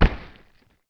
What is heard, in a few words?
THUD Dark; mono; Universal Sound FX; THUDS & THUMPS